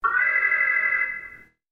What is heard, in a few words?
Alert High; Star Trek TNG